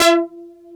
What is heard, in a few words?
PROPHET5 SYN, SYNTH; East West - Global Sounds; USA SYNTH